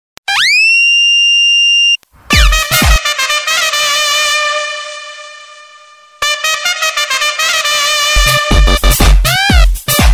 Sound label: Slow Mix; Ipl